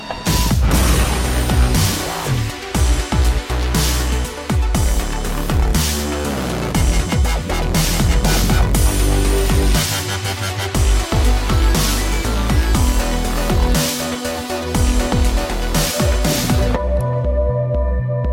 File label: dubstep